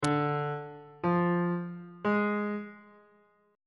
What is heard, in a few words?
e flat major chord 7 is d diminished on bass clef